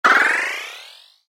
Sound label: Generic Bonus Or Pickup, video game; Games; Stuart Duffield